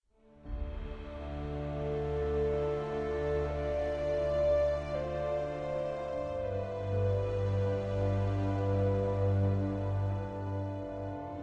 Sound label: player turn nature; devils demons android game music